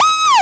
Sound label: Lazer